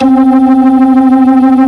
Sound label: B3 LO DIST, ToneWheel Organ; E-MU Formula 4000 Series Vol. 3; Analog Odyssey